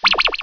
skip; Cards for Kids

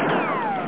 bounce; dosgames; tankfor2

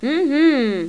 hmm; Tetris Collection for Windows 98

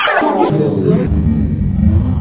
ghostly

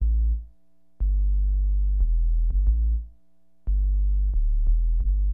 LO BASS, HipHop; LiveAct Pool

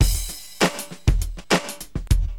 Drums, Acid Jazz Funk; LiveAct Pool

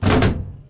load

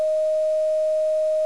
flatline

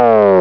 FOLD

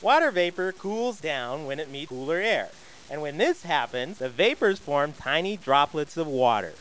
steam

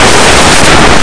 book

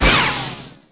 ricochet; PC Gamer IT CD 30

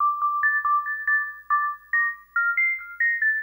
Tranc, Techno Melody; Techno Music Maker

